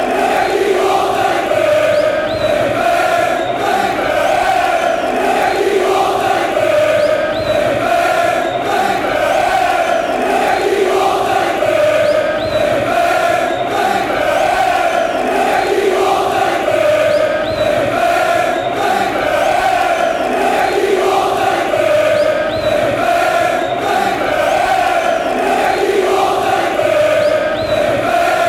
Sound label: come, chants